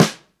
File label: Snare Natural Open; Lost Tapes From Mars